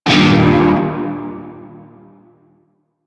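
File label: Shawzin Minor Chord C; Music; Warframe; Narmer Shawzin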